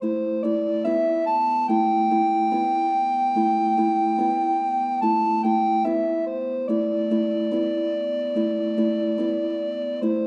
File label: Chinese Dance; MTWFC VOL1 Audio Accompaniments